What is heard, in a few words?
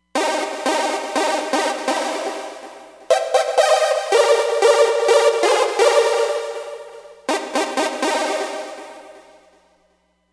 Mod Chord, Roland Fantom XR